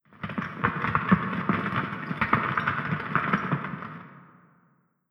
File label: building rubble; War Ambience